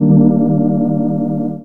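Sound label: Pad Synth; Equipped Music; Loungin House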